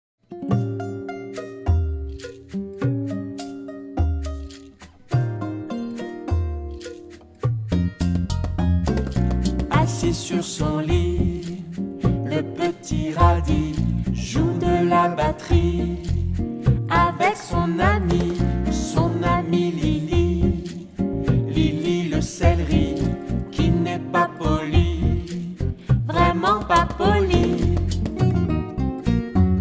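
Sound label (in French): légumes farfelus, comptines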